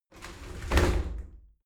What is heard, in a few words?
shower slide doors close regular